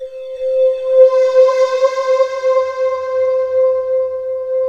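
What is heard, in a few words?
UNDER WATER, cyberpunk; Rubber Chicken Software Sample Bank; CYPERPUNK 2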